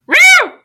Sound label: wrieuw